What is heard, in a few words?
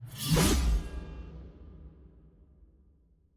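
guillotine whoosh; ambience room torture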